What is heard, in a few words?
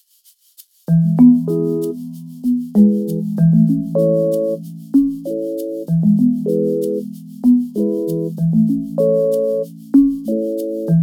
Gentle Marimbas; Marimba, Vibes, Chimes and Bells